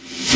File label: Reverse Snare